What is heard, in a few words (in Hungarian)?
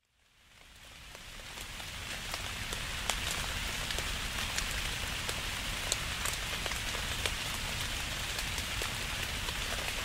erdo eso white noise